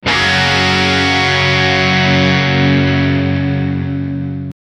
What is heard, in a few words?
single electro guitar, heavy metal